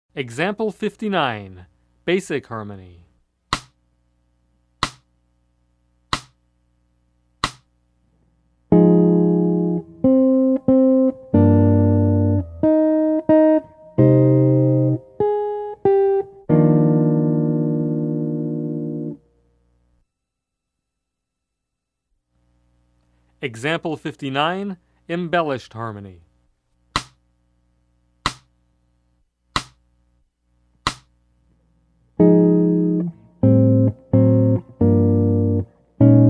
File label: Jazz